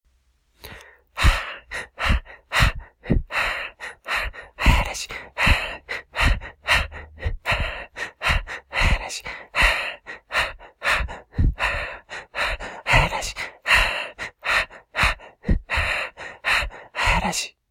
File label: BREATH